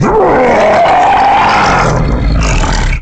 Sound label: Godzilla Roar